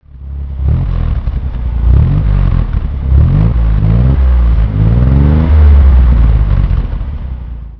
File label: flaminia